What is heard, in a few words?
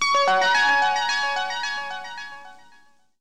chime